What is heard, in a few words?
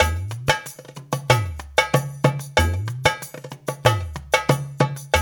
Dara Dance, Darbuka; Spectrasonics; Supreme Beats; World Dance